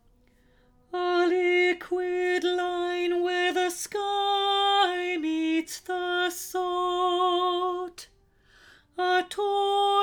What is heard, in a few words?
Alto Verse